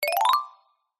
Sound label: game sound mallet climb ascend